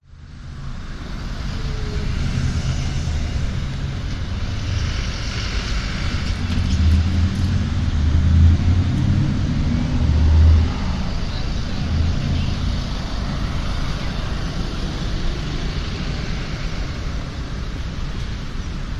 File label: vehicles traffic outdoor carpark shopping mall entrance australia; zapsplat